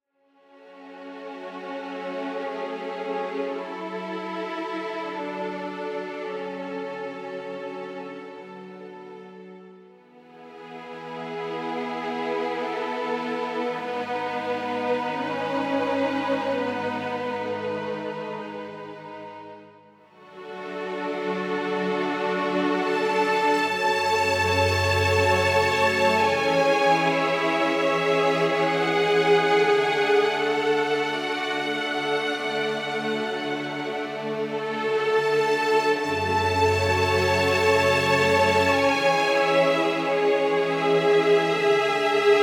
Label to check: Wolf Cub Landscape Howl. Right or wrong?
Wrong